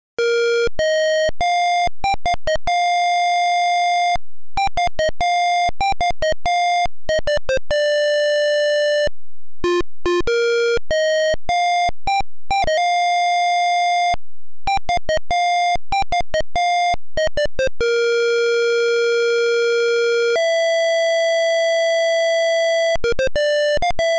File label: SQ3 Speaker